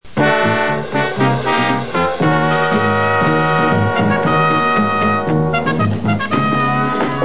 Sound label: music